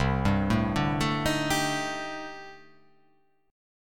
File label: C Minor 6th Add 9th C